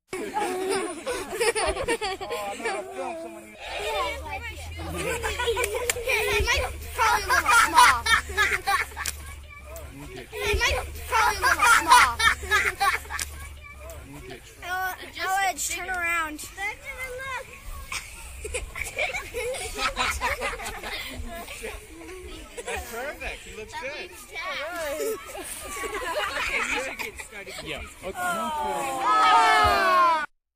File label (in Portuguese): Crianças na escola